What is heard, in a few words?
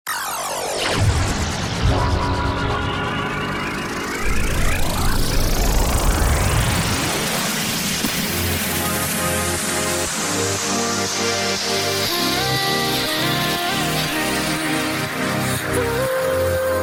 synthetic